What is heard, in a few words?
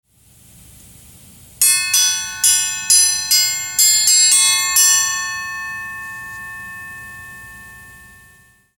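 Triangle, Chimes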